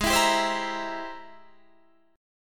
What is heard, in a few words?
G sharp Augmented Major 9th G sharp Guitar Half Step Down